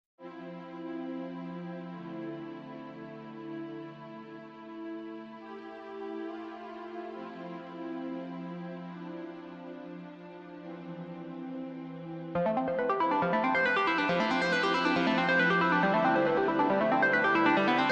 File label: TRANCE